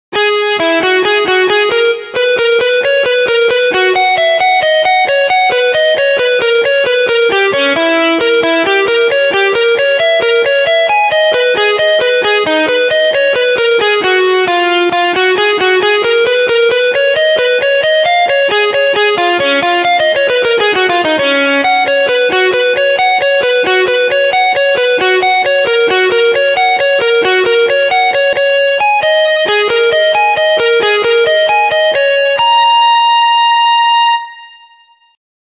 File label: crystal energy guitar solo half speed